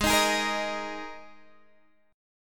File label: G sharp Suspended 2nd, G sharp, down, Guitar, Half Step Down; G#sus2